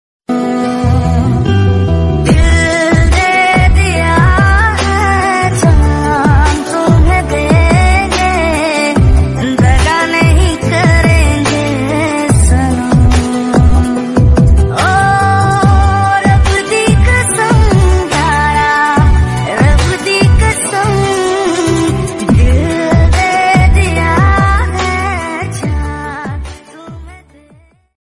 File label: soft music tone ringtone, bollywood